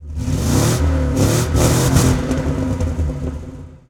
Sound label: Truck Explorer Rev; Trucks